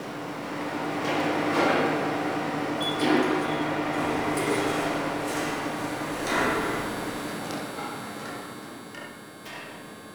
Interior Hub Medium Bed Loop, Ambience; Warframe; Nineteen Ninety Nine

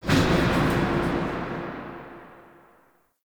Subway Misc; Nineteen Ninety Nine

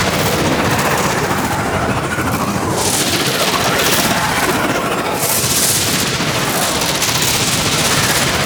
Ship Void Portal Loop A; Gameplay; Ambience; Warframe; Corpus Ship Remaster

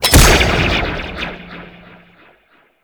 Bolt Zipline; Warframe; Ranger

